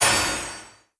UI Schedule Complete; Conquest; blue archive

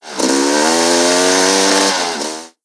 Vehicle Engine; blue archive

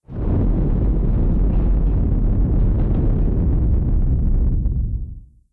Boom Effect; blue archive

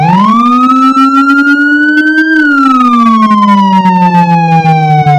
wail, sirens; federal sig rumbler

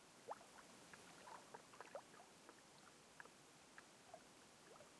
BG Urban River; blue archive

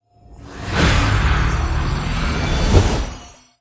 Portal; blue archive

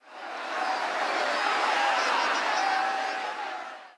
Crowd; blue archive

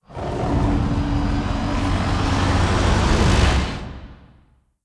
Laser; Binah; blue archive

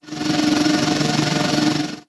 Drone; blue archive

